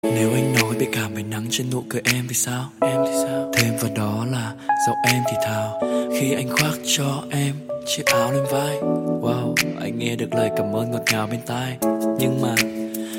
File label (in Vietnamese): Nhac Chuong Cam Nang